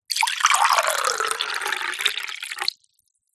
food drink still pour from jug into small glass; zapsplat

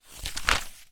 directory telephone paper page turn; foley

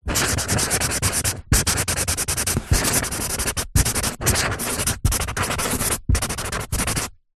office pen felt tip write on cardboard sheet

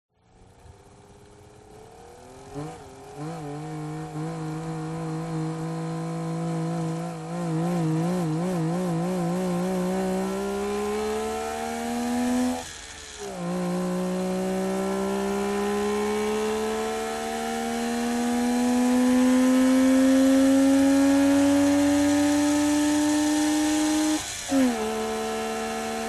TRANSPORTATION CAR Saab 92 Accelerate Engine

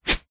sport tennis racket single swing fast whoosh

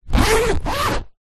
foley suitcase zipper

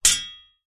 warfare swords hit single strike

